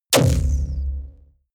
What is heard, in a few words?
sci-fi sucking implosion; schusswaffen